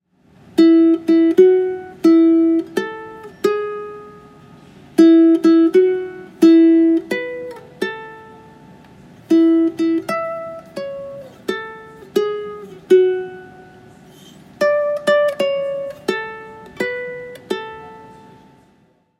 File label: happy birthday, one string ukulele